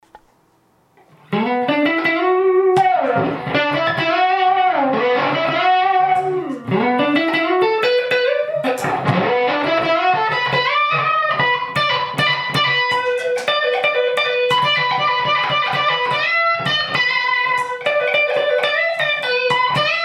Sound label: ring resonator sound sample